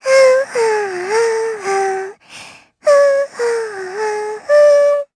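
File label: Vox Hum Japanese; Lilia; kings raid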